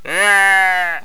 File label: sheep; megaglest